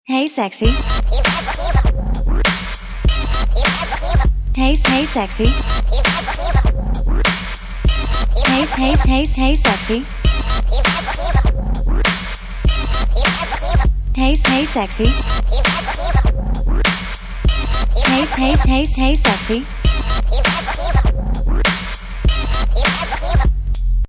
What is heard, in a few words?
Hey Sexy DJ Scratch; Novelty Ringtone; Music Ringtone; Musical